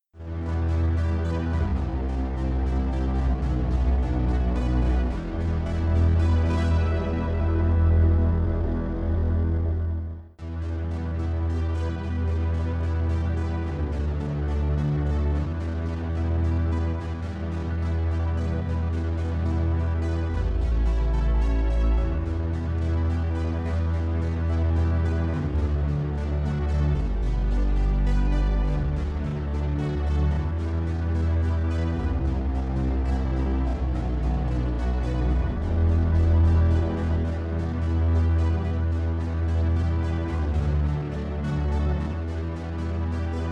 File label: Backing Track D minor; Scarborough Fair; 8va lower